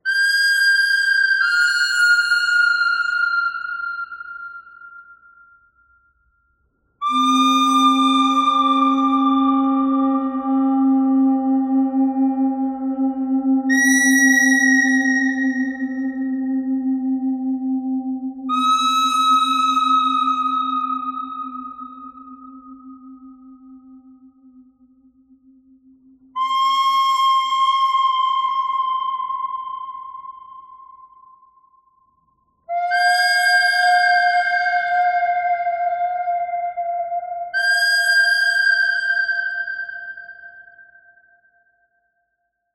bird thread harmonic

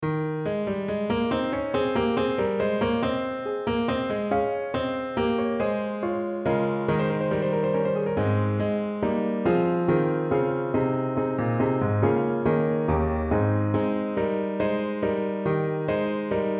Gia il sole, Ab accompaniment

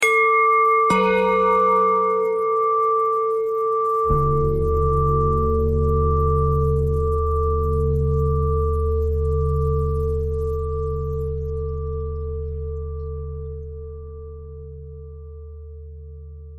bell bend threads

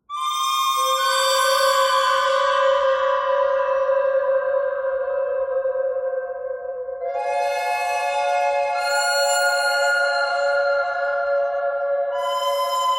bird thread harmonic

